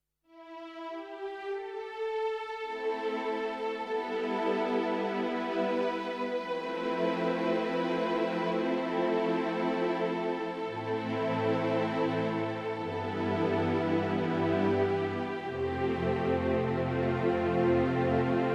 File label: DGX650 Strings